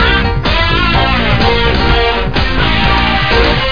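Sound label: guitar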